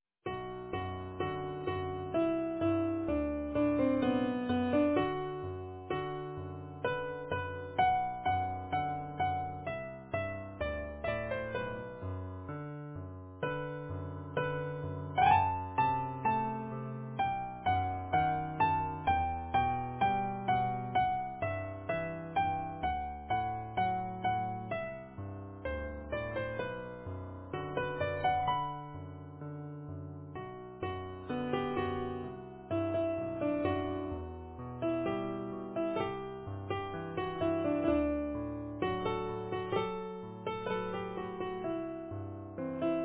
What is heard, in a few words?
Easy Piano